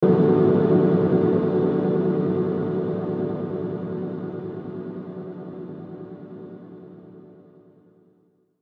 Hit Low Chopper Long; Production Element Imaging Element Accent Transition; Bass Button; Bass Buttons; Blastwave

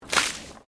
Water Splash Concrete Small; Elemental weather effects; Nature

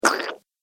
Strangled Slime Sound; Slimy Sound Effects

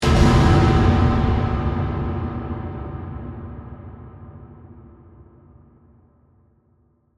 Hit Metal Impact, Reverb; Production Element, Imaging Element, Accent, Transition

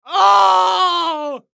Human Grunt; Grunt Scream Painful Ohh Element Male 20 Yrs; Blastwave

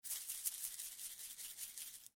Food Seasoning Bottle Shake Long; Household; SFX Bible

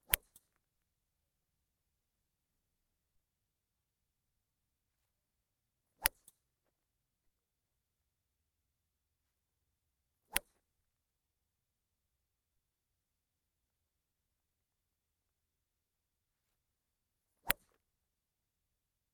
Golf, five driving shots; BBC

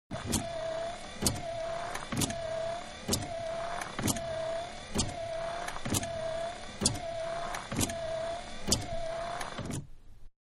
Big Car Windshield Wipers Slow, Exterior; Cars; Vehicles; Manhattan Production Music

